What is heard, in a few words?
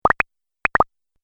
Idea Bubble Pops, cartoon; Cartoon Sound Design